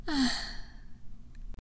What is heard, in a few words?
Moan